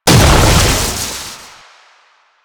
Scythe Proj Die; Stalker Jade Scythe

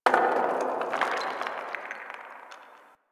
Landscape Rock Slide Low, Ambience; Warframe; Venus Open World; One Offs

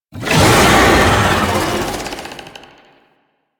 Tank Infested Vocal Attack Swipe; Enemies; Barks; Warframe; Lasrian

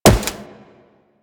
Wall Jump Default

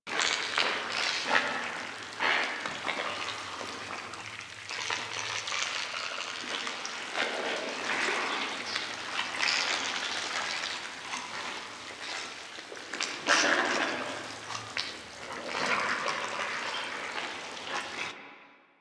Lair Chatter Loop Interior; Warframe; Ambience; Sentient

